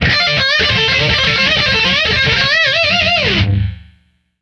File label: Guitar Shred